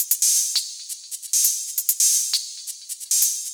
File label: Drums Techno